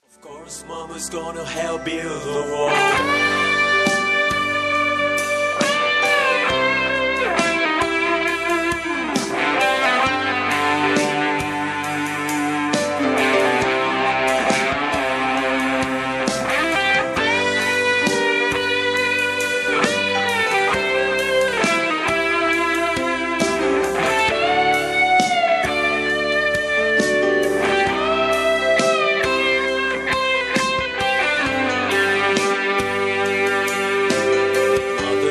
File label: mother solo